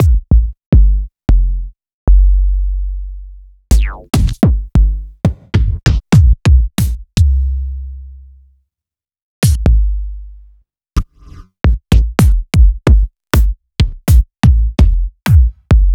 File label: Bassdrums; Sliced